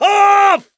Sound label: scream while pounced, mechanic, survivor